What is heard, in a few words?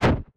shoot fire; Learn to Code From Zero With Godot; spells